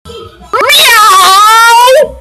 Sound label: miauw